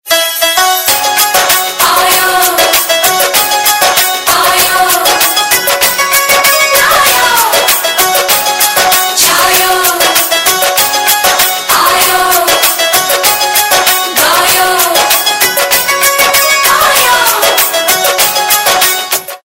Music

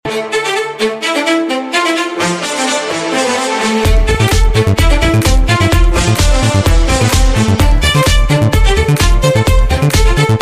violin

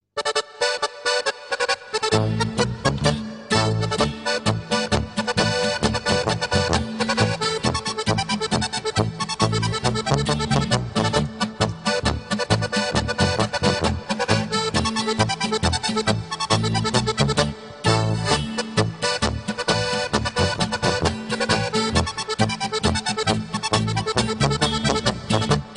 Apres Ski Winter Hit

